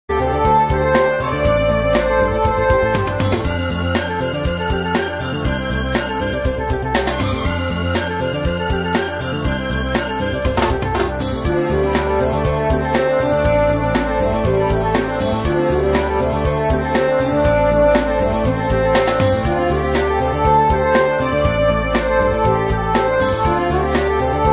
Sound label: Orchestral; Last Ninja